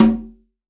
CONGA, STEREO CONGA

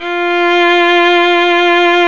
STR VL F 5, Chamber; Roland L-CD702; Combos 4; Chamber Grp2

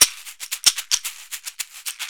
Caxixi Beats; Roland LCDP14 Africa VOL-2

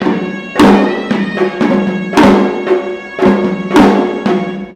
MIX, India; Best Service; Ethnic Journey Sound Cube

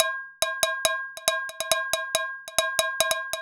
Saucepan Solo (140BPM); Loops

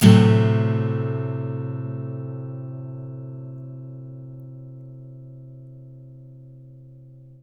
Blues Guitar, BARI CHORDS; ILIO; Fretworks

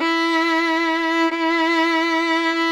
VLN E3; Sonic Reality; American Heartland

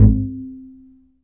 E STRING UPRIGHT BASS; Larry Seyer

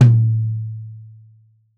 Tom2 Stereo, Deeply Sampled Drums

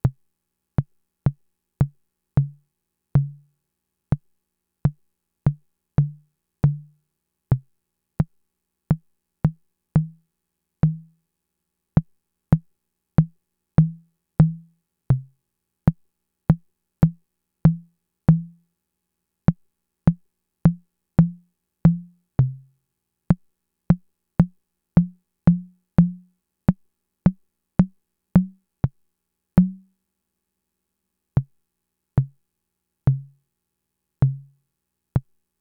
Fricke MFB-522 TOMS; Sliced

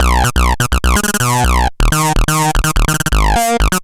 Acid Bass; Sonic Foundry (Sony Creative Software); Underground UK House & Electro

